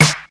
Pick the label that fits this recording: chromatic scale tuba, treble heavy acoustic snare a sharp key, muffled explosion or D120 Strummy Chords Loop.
treble heavy acoustic snare a sharp key